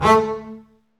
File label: STR, STRINGS; Roland - LA Composer vol. 1